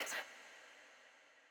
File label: Whisperings End; bloom minigame